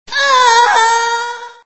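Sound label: Female Agony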